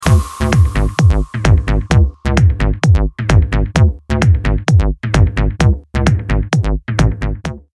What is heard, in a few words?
detuned